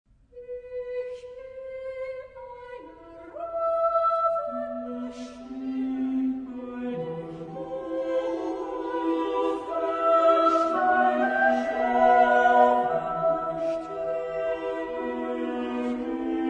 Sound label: chorus; Schutz; Ich bin eine rufende Stimme SWV383